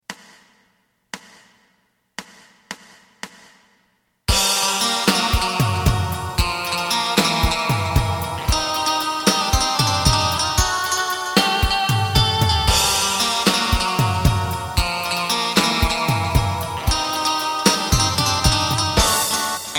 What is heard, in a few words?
bass